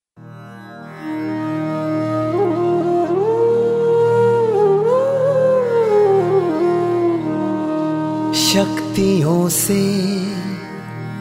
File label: Duet Full Songs